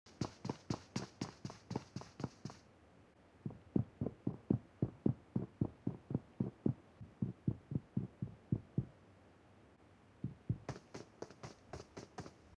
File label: Foot in pubg; پابجی